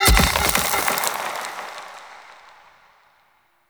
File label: Blind Sand Target Activate; Powers; Fey; Saboteur